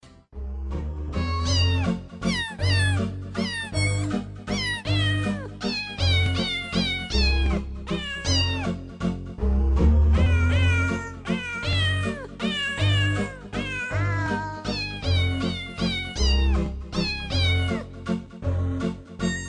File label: Singing Cat